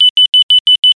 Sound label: Beeps; PC Gamer IT CD 48; Big bang